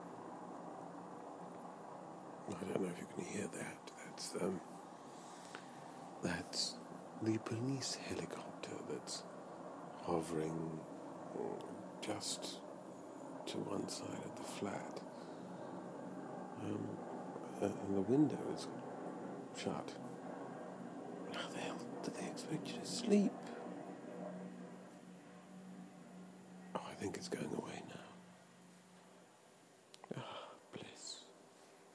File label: loud copper chopper